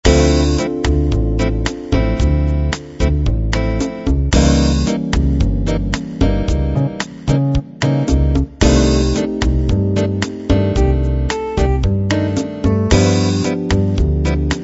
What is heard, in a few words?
bossa